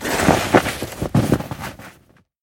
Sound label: falling; Downhill Ski